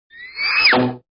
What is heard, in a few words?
Arrow, cartoons